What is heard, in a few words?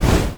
shoot fireball; Epic Toon FX